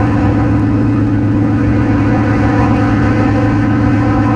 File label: portal power, ambience; vendetta